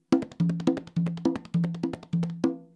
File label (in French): roulement ternaire, congas